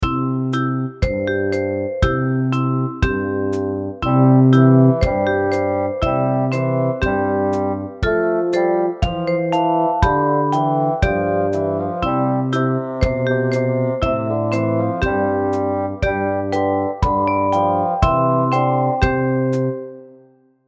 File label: slow tempo, ukulele; This Old Man; Rainbow Ukulele Play-Along